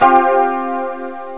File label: record sus4